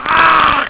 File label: Zombie die; Wolfenstein 3D